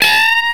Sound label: WAIL GUITAR; FAIRLIGHT CMI IIX; GUITARS